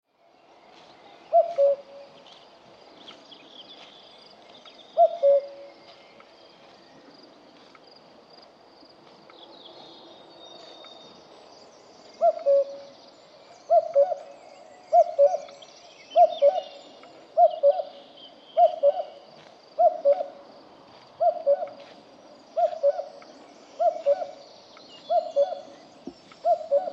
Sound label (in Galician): cuco cuculus canorus